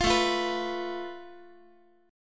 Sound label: A Suspended 2nd Sharp 5th, Guitar; Asus2#5; strummed; x,x,8,7,9,10; 4A,4E,5A,5D,5G#,6C#; JGuitar